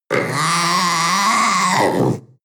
panther young vocal yowl

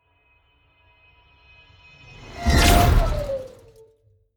whoosh metal bow howl

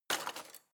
knife wood drawer grab hard

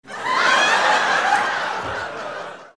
laugh

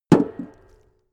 metal impact tank small solid

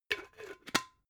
glass bottle massive close cap

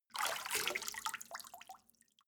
step water sneak out

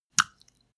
step barefoot wet hard

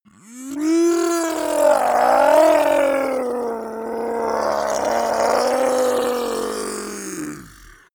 zombie human change

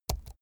keyboard laptop key press slow soft

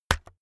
keyboard external key hit fast

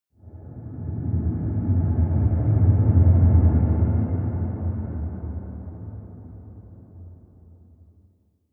air dark fire

